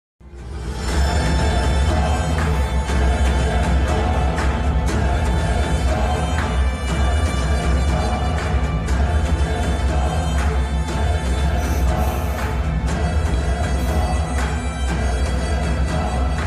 climax scene movie bgm ringtone; eagle

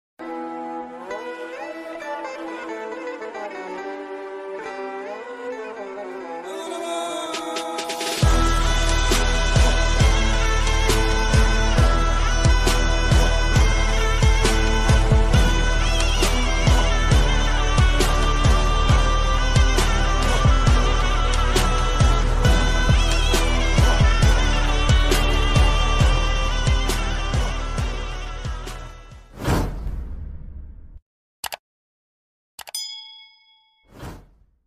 motivational ringtone

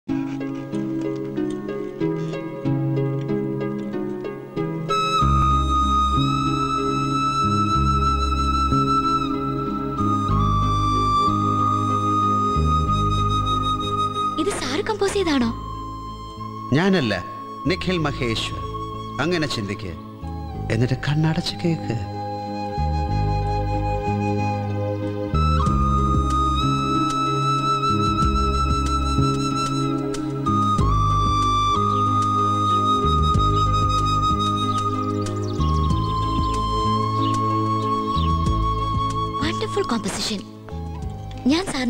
bgm flute; devadoothan

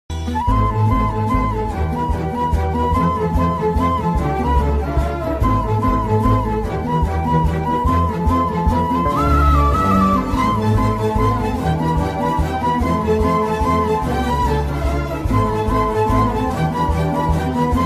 emotional violin ringtone; Dhanush; Moonu movie

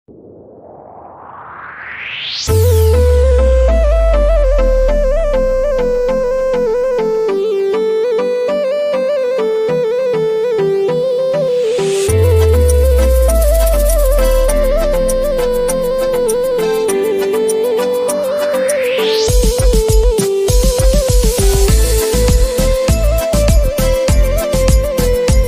love bgm ringtone flute; tamil; trending tamil ringtone

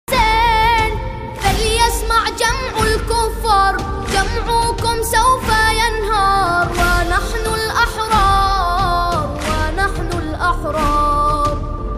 islamic ringtone naat

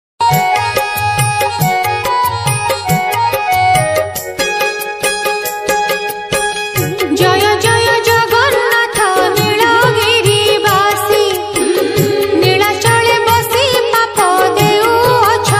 odia jagannath bhajan

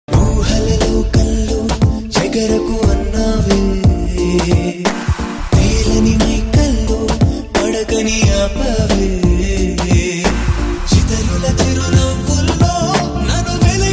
telugu love songs; parugu; love failure

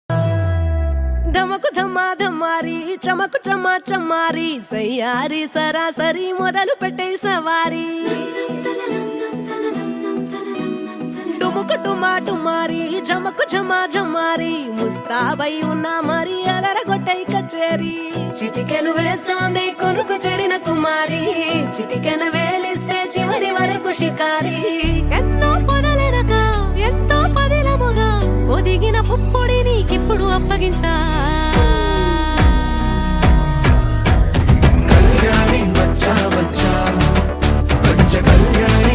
wedding music telugu; bollywood song

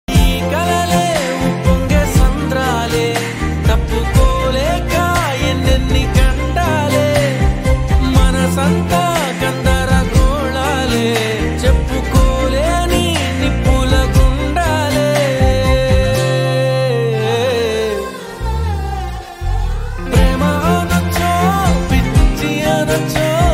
telugu love songs

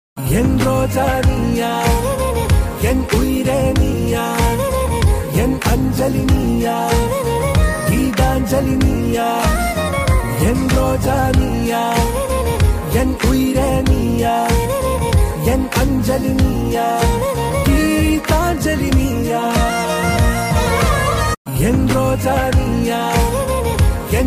ringtone, tamil love

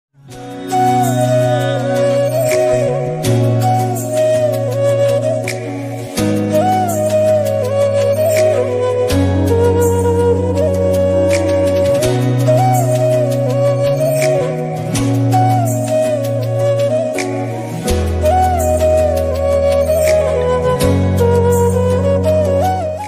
christian music christian ringtones flute music

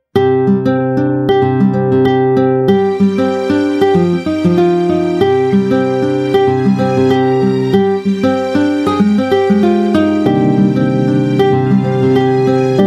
soft instrumental ringtone

